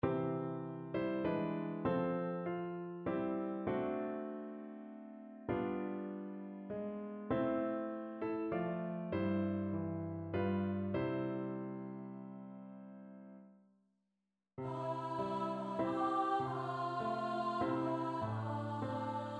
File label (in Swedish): under ronn och syren alt och piano ljudfil; frillekoren